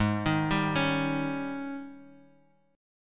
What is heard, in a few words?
Ab Major 7th Suspended 4th, chord; down; 4G,5C,5E,5A